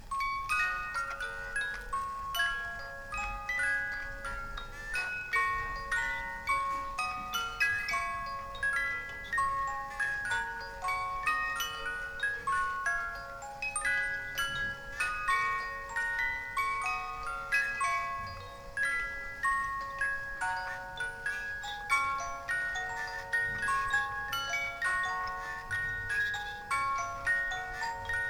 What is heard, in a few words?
pig music